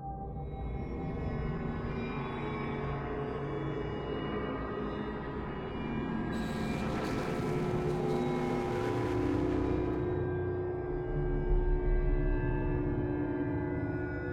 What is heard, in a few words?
Embrion Ambience